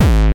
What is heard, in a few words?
Bassdrums Hard, Hard Kicks; Vengeance - Essential Clubsounds Vol.2